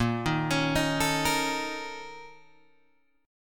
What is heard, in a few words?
Bb Minor Major 7th Double Flat 5th, Guitar, Open G